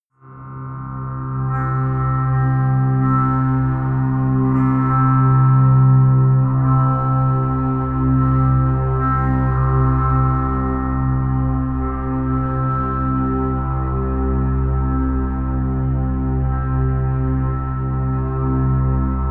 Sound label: drone simple strings